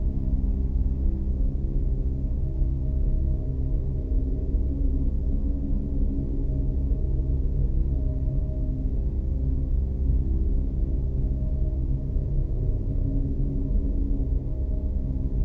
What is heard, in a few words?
Music loop